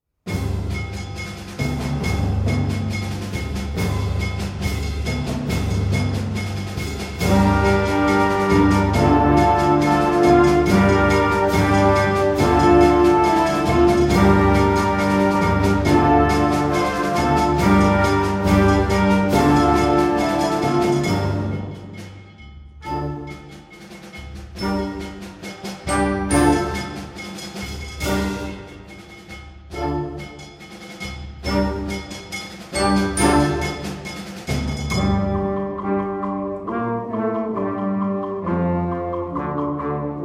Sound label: percussion; excelcia music